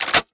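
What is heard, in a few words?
forestock forward; Valorant; bucky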